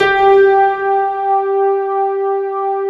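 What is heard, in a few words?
island piano G3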